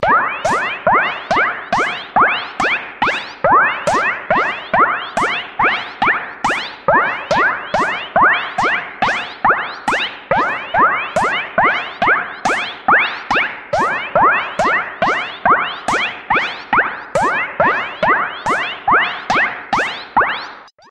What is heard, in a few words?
Submarine Air bubble